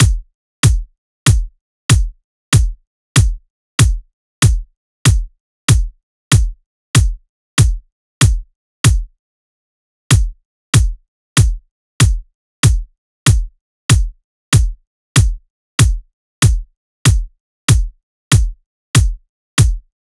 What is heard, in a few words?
Drum Loop 95 BPM Kick; Hip Hop; Cymatics; Perro Drum Loop